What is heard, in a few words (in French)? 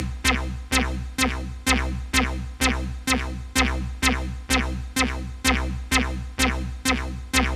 synthe acid long part